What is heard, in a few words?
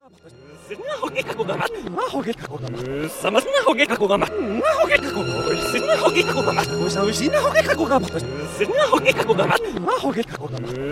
RINGTONE